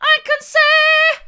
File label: i can see hard, vocal